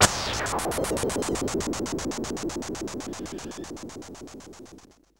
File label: HIT, STABS, House